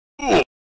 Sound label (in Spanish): Sonido de minecraft muerte